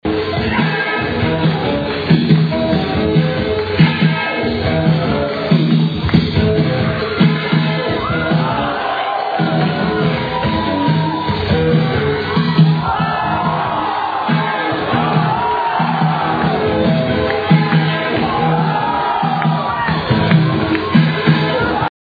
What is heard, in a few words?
disco